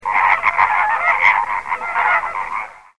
Phoenicopterus ruber

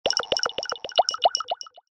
atmos liquid drop

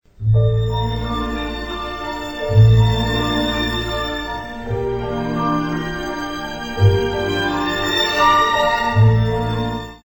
concertino

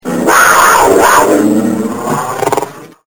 lion

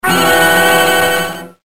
dramatic

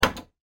button

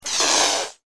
Cat hiss

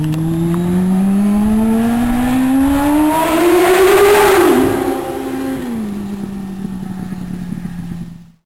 Tunnel

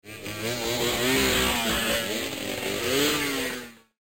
dirt track

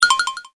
WIGGLE

